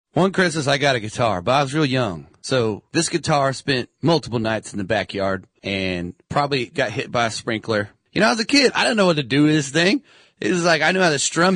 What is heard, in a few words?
christmas guitar